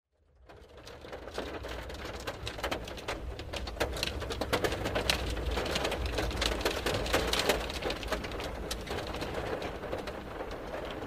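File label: in a car with hail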